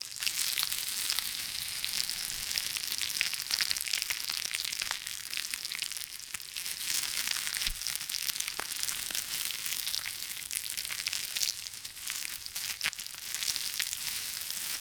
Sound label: sound of fried meat; appetizing